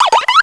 Cry

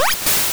Water Gun; Pokemon Essentials; PKMN Estelar 1.3

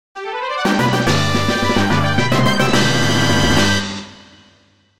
Slots big win; Pokemon Essentials; PKMN Estelar 1.3

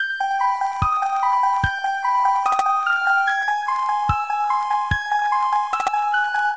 ice, music; SuperMarioWar

